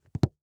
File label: FOOTSTEP Leather Wood Hollow Jog mono; Phasmophobia